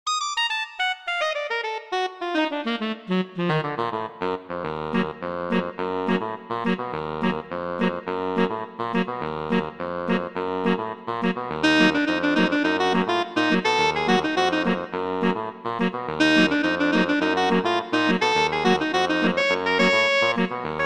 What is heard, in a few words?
Prelude for Saxophone quartet